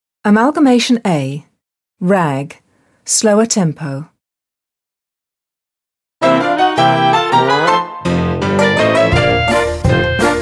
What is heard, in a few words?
Rag slower tempo